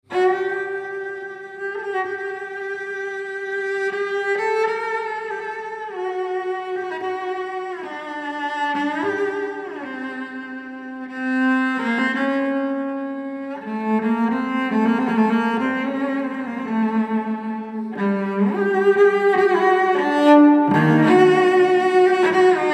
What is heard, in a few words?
Agnus Dei, Cello Solo